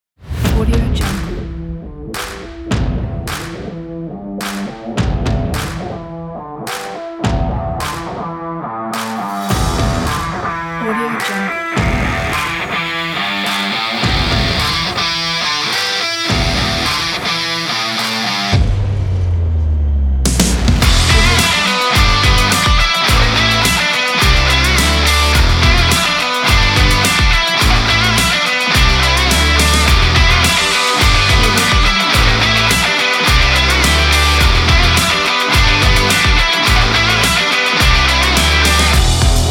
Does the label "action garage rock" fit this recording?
Yes